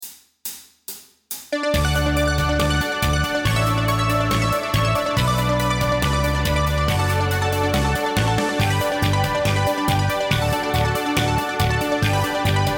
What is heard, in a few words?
Cannon In D Backing Track